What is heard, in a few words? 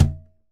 Cajon Bass open; Best Service; Festa Latina VOL-1; Single-Hits